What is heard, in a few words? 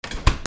KITCHEN